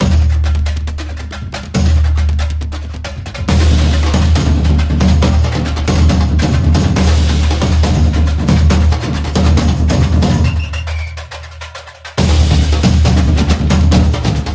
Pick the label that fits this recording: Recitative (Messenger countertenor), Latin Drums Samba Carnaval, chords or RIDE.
Latin Drums Samba Carnaval